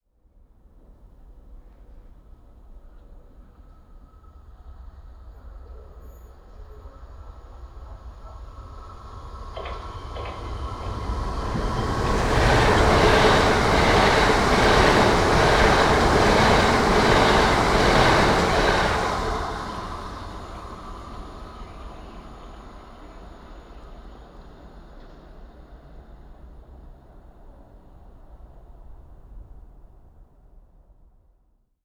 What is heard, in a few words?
train pass by, uk; pcm m10; elmsteadwoods